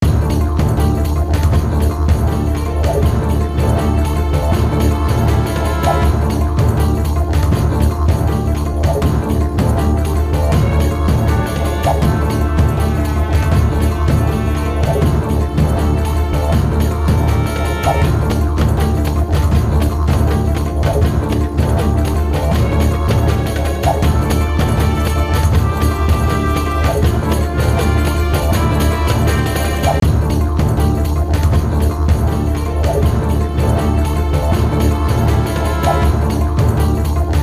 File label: battle duel; Gladiator Begins; PSP